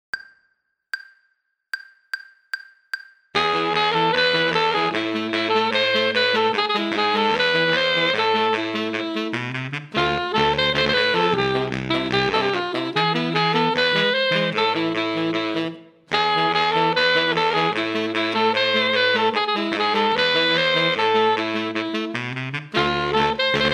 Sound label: tetris playalong soprano